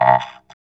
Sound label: VOX VOX, Electro Vox